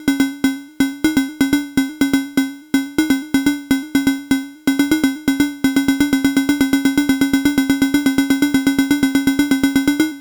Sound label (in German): im Taktraster versch. Schrittweiten Trigger; Bastl Instruments Popcorn